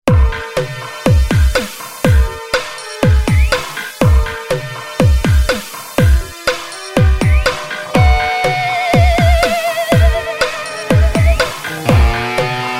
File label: Boy Band, no vocals; Soundzabound